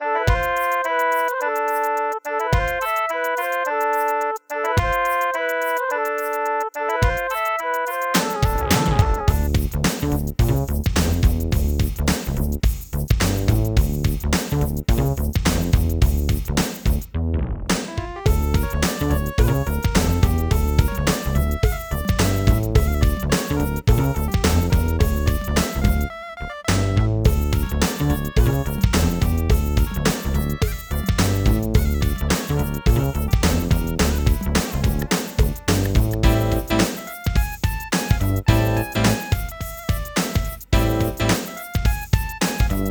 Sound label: Egypt, LG MIDI ART GS1000R; Lost Vikings